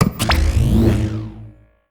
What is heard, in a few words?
monitor start